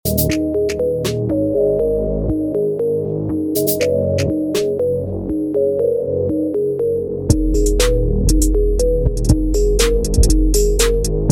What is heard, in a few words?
trap; MIUI12